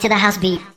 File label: Vocal Phrases; Vengeance Samples; Vocals